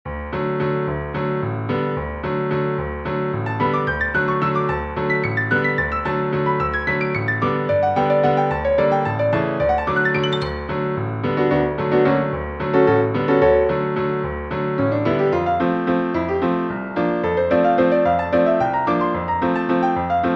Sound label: solo piano; five incidentals